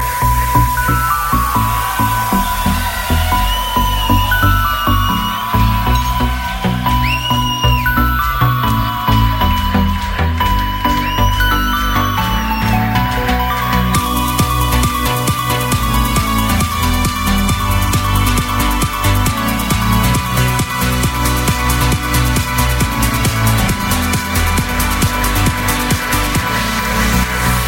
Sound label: Beautiful Ringtone; meloboom